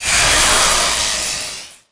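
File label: player force lightning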